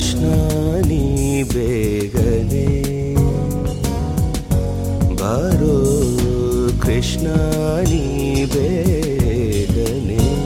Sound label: krishna prayer tone, ringtone